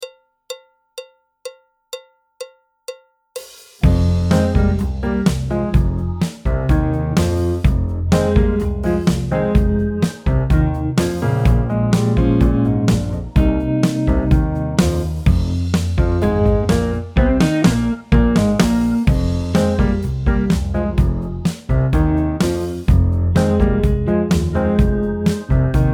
Setting the Pace trumpet; Jazz Syllabus Level 4

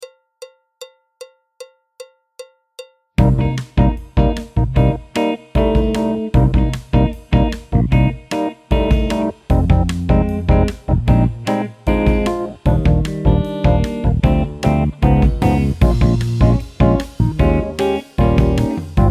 tenor, trumpet; Jazz Syllabus Level 4

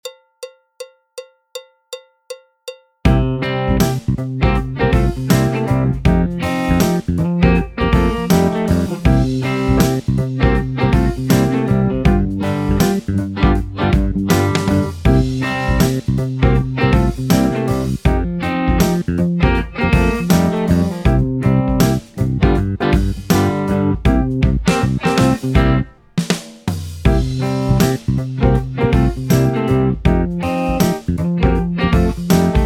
Blue Trainers BT (tenor, trumpet) Extended; Jazz Syllabus Level 4

